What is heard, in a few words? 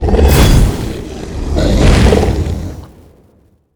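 boss daspensio death, monster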